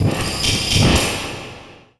Criket Fx Fill, Cricket

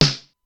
Simmons SDS-9 drum machine

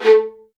A3, LOUD VIOLINS; Q Up Arts; Denny Jaeger Master Violin Library

